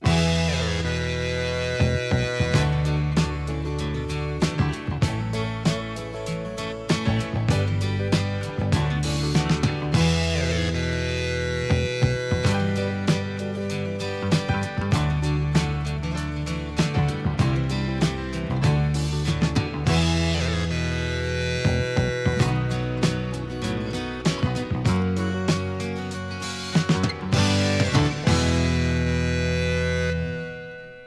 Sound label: topformat maxi jingle single